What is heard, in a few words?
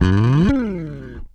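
SB SLIDE, SPECTOR BASS; Northstar - New Gold VOL-1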